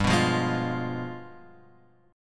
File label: G 7th Flat 9th G; G7b9 Chord {3 2 3 1 X 1} strummed